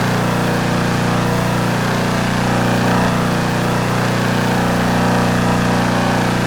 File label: low, pickup